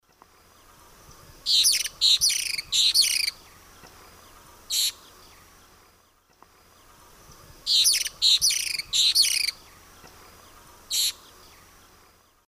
Tyrannopsis sulphurea, dawn song; S. America; Eastern Lowlands

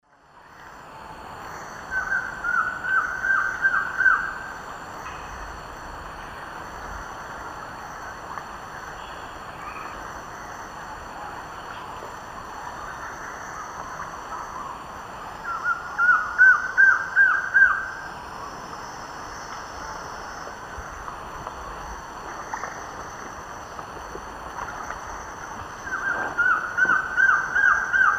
Trogon collaris, song; S. America; Eastern Lowlands

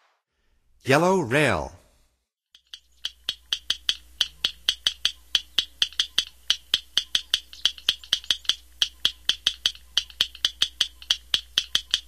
Yellow Rail; North America; Stokes

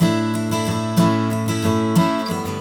Guitar A, Acoustic

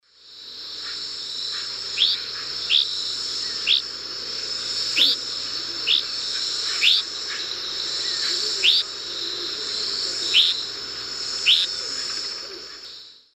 Tachycineta albiventer, calls from perch; S. America; Eastern Lowlands

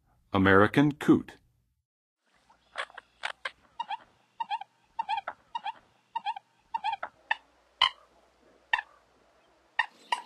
American Coot; North America; Western USA; Western USA Songbirds

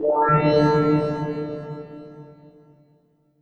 Bell FX; Dance; SFX

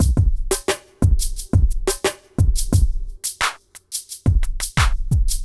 bad mood beat

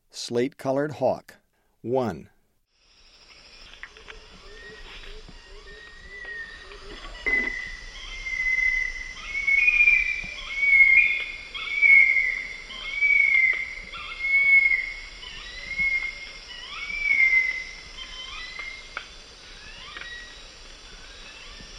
Slate-colored Hawk; S. America; Eastern Lowlands

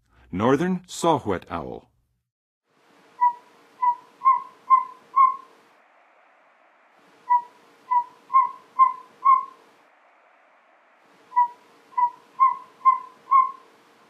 Northern Saw-Whet Owl, Birds of North America; Western USA; Western USA Songbirds

